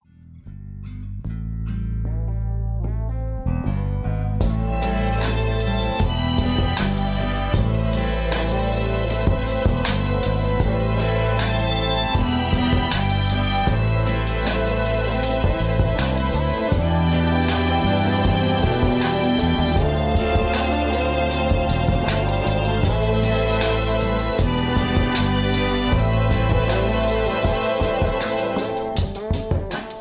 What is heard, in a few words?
Backing Tracks